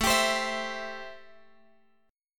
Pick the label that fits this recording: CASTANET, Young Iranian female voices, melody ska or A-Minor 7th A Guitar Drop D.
A-Minor 7th A Guitar Drop D